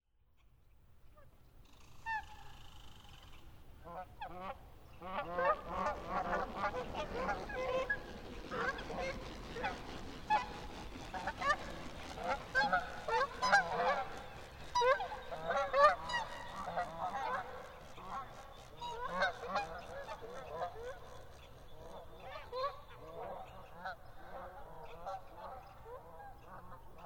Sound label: yell